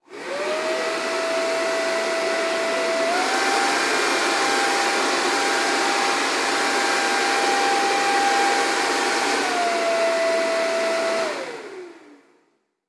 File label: Super Blower Vac